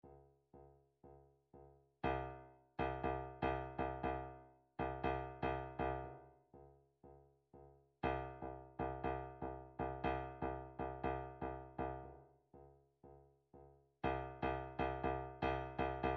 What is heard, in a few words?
drumtrax toolbox kick